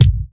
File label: focused kick sample a sharp key, kick single hit tuned to the A# note; kick drum samples